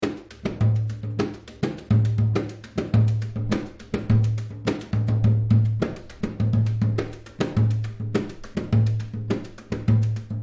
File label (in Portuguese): rebolo